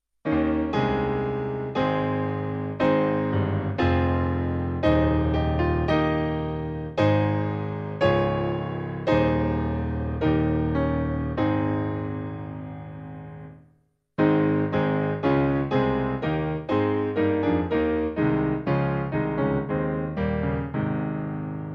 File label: simple piano; All Hail The Power